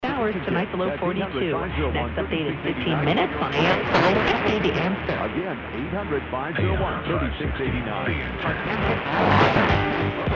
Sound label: wind